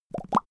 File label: bubbling lips